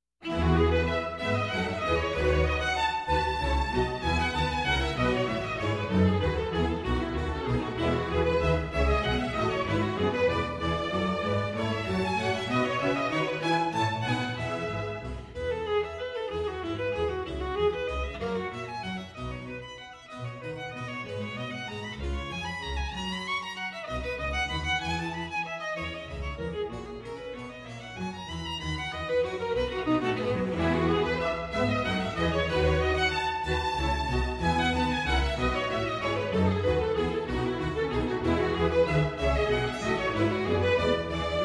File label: violin concerto; in E major, BWV 1042 - Allegro